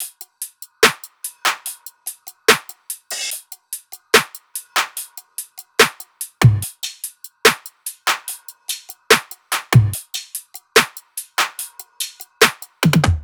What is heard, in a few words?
loop top 145; Seismic Loops